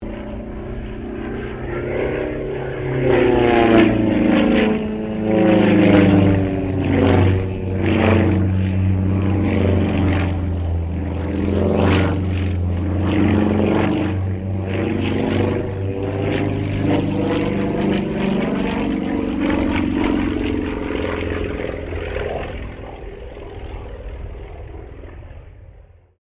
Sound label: Sukhoi Su-26M acrobatic pass; SU-26m Acrobatic aircraft; Airplanes; Vehicles